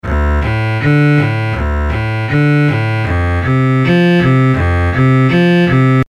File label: TG100 Cello